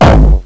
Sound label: hard-mid.-dist, kick